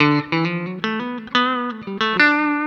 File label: COUNTRY